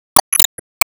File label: Bonus Balloon